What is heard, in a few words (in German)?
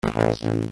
voc brechen